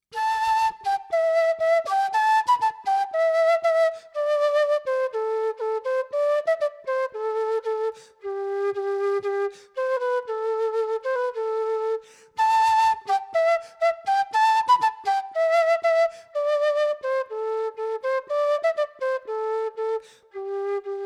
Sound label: quena rosewood; G